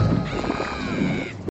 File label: screaming; dory